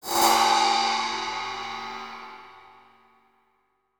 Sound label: ride